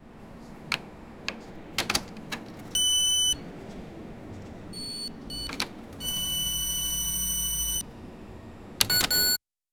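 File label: cash register scanner beep; retail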